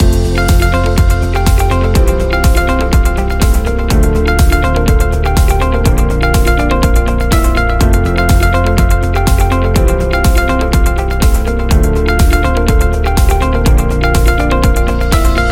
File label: Positive Corporate Loop